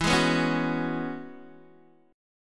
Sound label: E 6th Add 9th E down Guitar Open D